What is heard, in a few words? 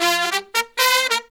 Pop & Funk Brass